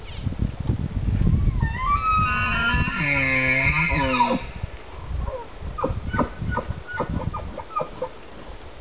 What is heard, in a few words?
Elk; 2013 USA-Canada